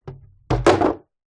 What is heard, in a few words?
foley wood large board drop ground